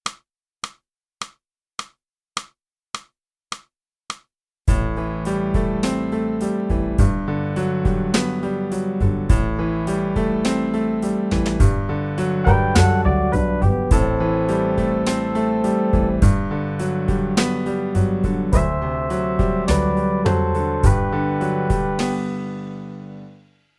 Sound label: flute